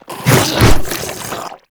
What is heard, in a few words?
Infested Ancient Strike Flesh; Warframe